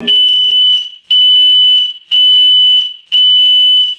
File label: harsh electronic prison alarm buzzer